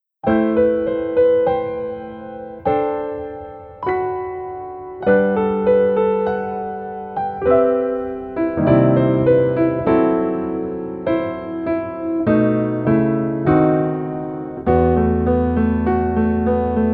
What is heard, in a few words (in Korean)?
Piano ver 멜로디 MR